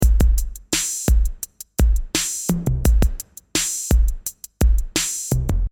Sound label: Eighties; 80s heat demos